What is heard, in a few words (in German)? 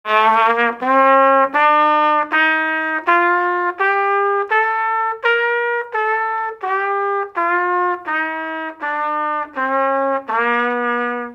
So klingt die Trompete